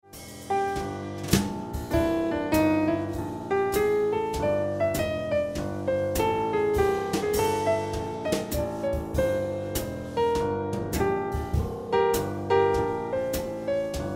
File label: Piano Music